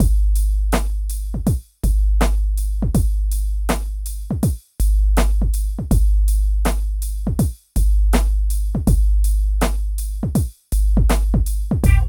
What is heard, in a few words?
DRUM LP; East West - Phat & Phunky CD2; 90's Sample CDs